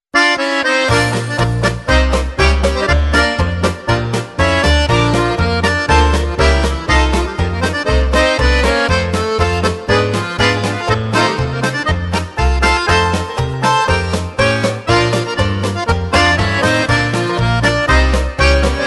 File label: Polka Christmas